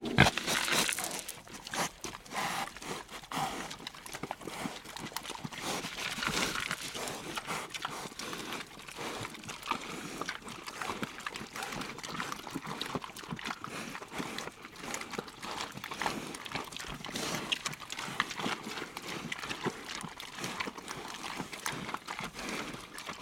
pigs tamworth x6 eat close up

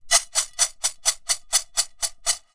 food rice dry risotto shake toss in frying pan; zapsplat

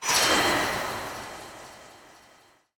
Transition Metallic; bottlerocket

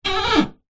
household tap faucet shower squeak turn

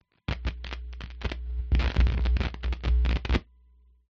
guitar cable noise; Sound Spark

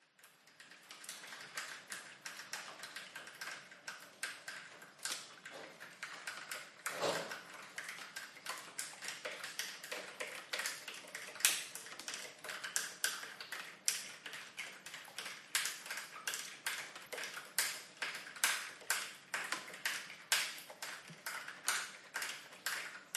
Rain Drippy Aftermath; ORTF; PCMD100; PMSFX

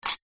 glass wine broken shard place into glass; household

